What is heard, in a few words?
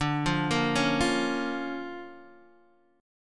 D Minor Major 7th Sharp 5th, D, Guitar, Open D